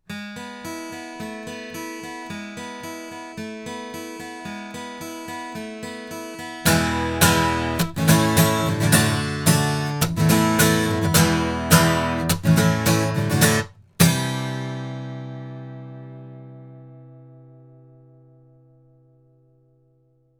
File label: Sontronics Helios cardioid guitar, microphones recordings